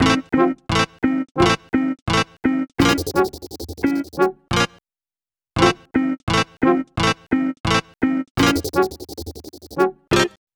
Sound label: Melody, Hip-Hop